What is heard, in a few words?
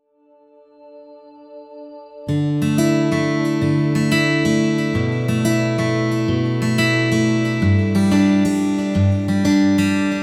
acoustic guitar spring short